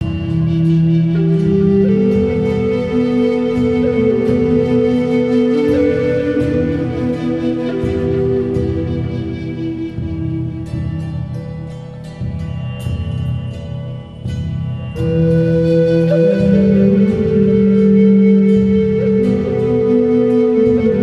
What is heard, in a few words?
Passion Flute